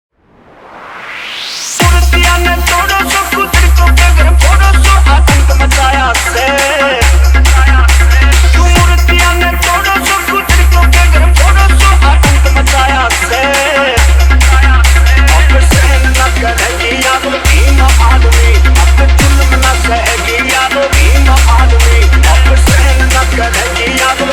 Trance Remix Dj Song; Heavy Output Animal Trance Remix; Bheem Jayanti

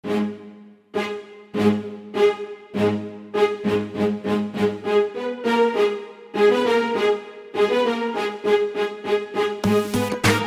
New Haryanvi Song

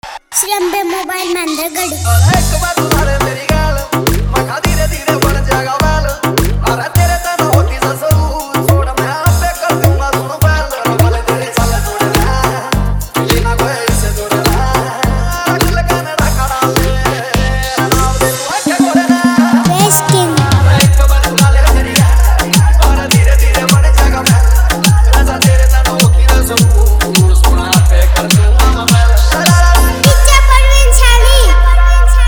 Hard Bass Remix Song

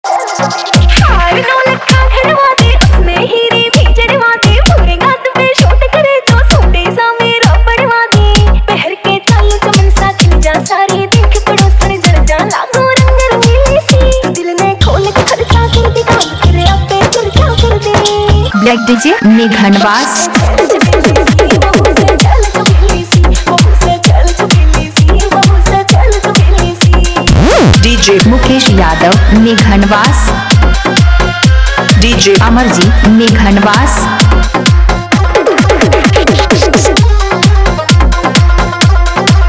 Remix New Haryanvi Dj Song

